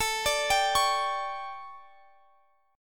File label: D Major 7th Suspended 4th, chord